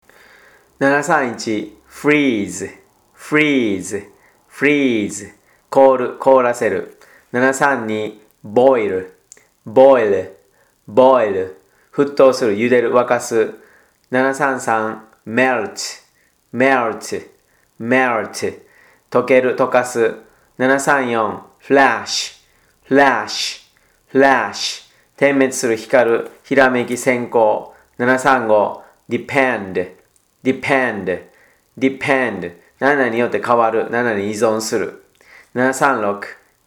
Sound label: tango